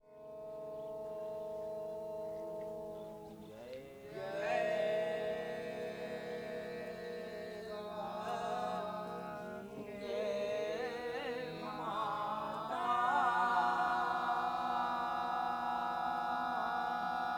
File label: Sunrise Ceremony at Panchganga Ghat Varanasi, Religious Music; Samuel Corwin; Selected Field Recordings from India and Nepal Volume II Religious Music